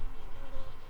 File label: Recording, bumblebees, Sylvarum workers